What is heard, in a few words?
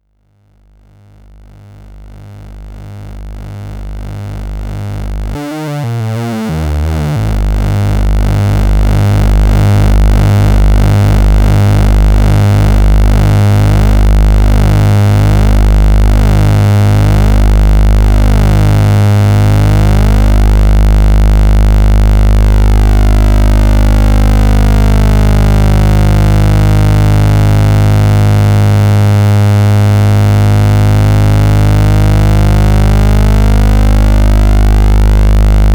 sonicsix fine coarse